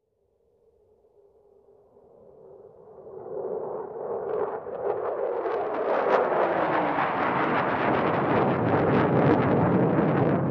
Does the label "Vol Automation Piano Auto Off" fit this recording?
No